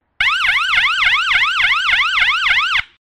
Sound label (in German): yelp sirene